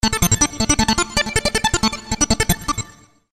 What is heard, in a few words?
electronic